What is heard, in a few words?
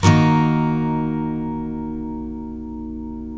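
steel D# minor up, CHORDS STEEL, Guitars; Spectrasonics; Hans Zimmer Guitars VOL-1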